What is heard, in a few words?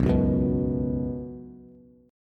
B Suspended 2nd B down Bass, Bsus2 chord; strummed; JGuitar